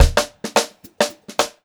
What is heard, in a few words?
Funk Groove Drumloops; USB Soundscan vol.38; AKAI; 90 s Sample CDs